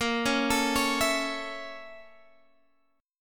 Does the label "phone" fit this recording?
No